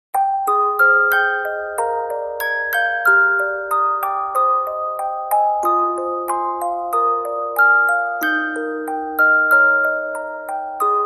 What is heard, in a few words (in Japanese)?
オルゴール